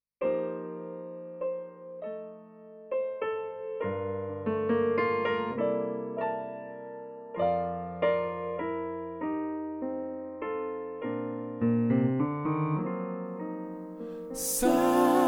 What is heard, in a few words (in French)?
Tenors et basses, Gospel; Silent night